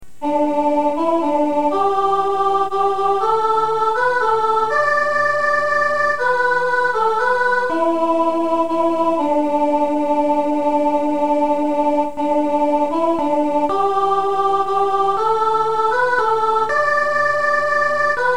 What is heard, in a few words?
skye boat sop